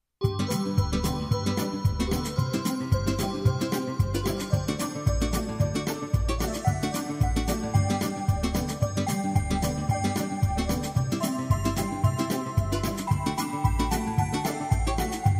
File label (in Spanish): Beat Navidad